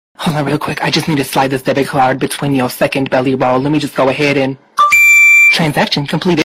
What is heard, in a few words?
transaction completed